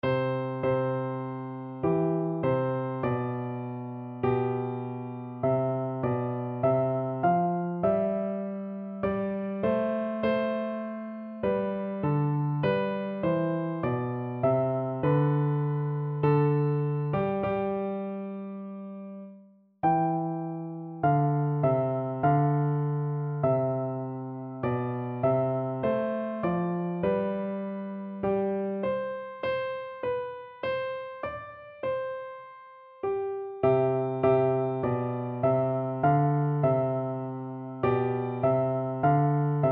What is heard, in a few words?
o come, piano duet